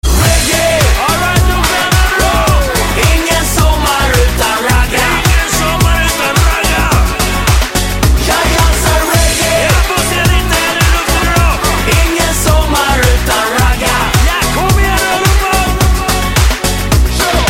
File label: summer reggae